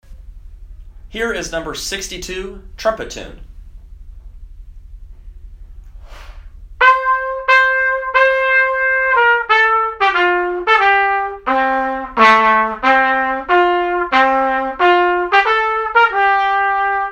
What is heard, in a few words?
trumpet tune